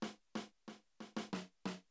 Unused Jingle; Super Play Action Football

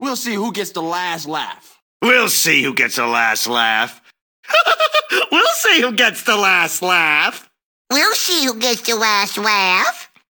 Last Laugh

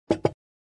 menu sfx unused

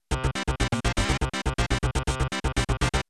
Unused Jingle; SNES Test Program

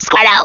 Damage Fly; SSBBrawl; Poke Zenigame

